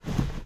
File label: driver foley wheel turn; Blind Drive